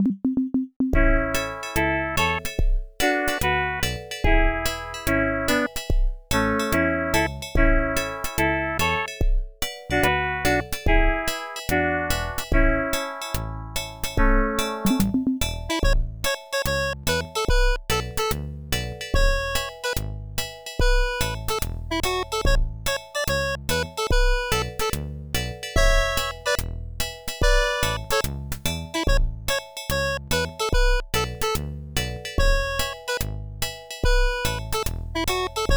reggae; Sound Blaster; IBM PC XT AT

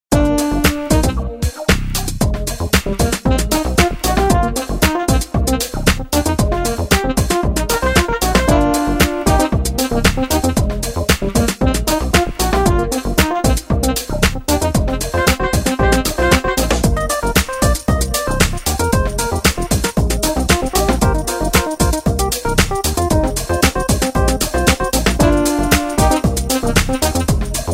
Funk; London Racer; PSX

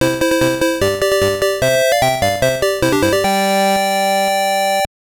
Jingle, gb; Lazlos Leap

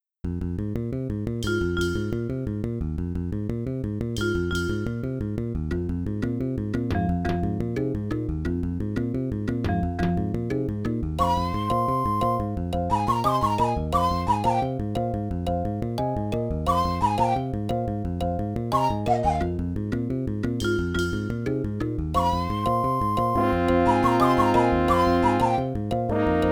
Treacherous Forest, Gravis Ultrasound; IBM-PC

